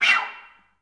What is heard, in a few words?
FL FX, Advanced Orchestra; Best Service